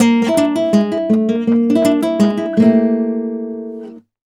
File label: Flamenco, Guitar, a